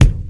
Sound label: 00s good kickdrum d sharp